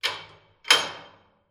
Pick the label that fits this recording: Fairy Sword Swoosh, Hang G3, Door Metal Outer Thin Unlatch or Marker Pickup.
Door Metal Outer Thin Unlatch